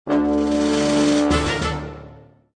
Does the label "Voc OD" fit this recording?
No